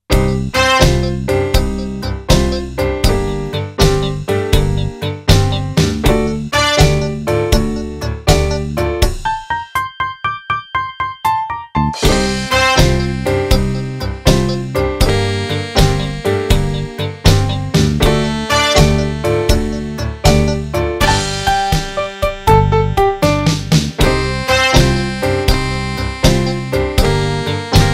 Scale Down

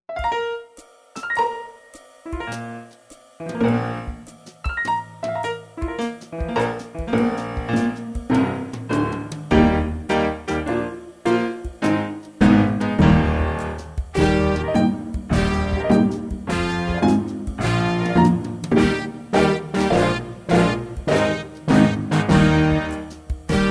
Shuffle Eb

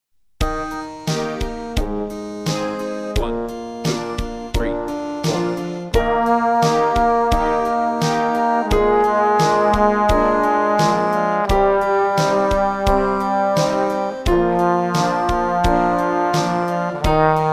scale down

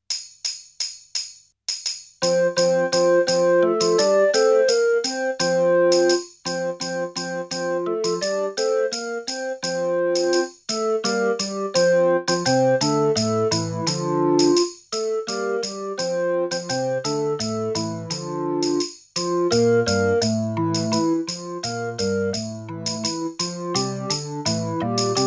Galliard, 107

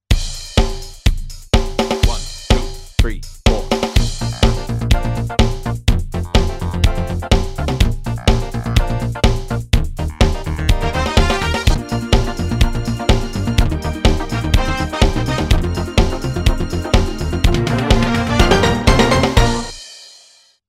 Tong Test Bb, 124

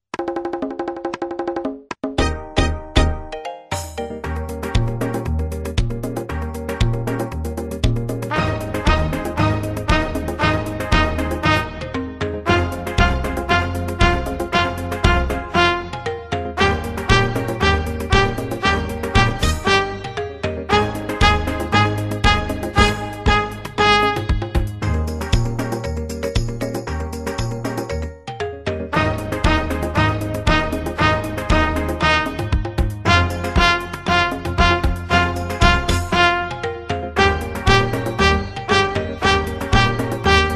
F Calypso Eb insts

